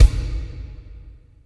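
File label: AMB bass drum; WORS STATION; AMBI BLEEP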